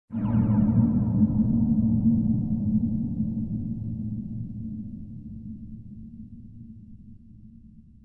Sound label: Power Wave, ambience